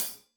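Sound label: hat closed, hi-hat cymbals stereo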